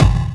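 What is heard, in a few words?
percussion FX, Dance Drums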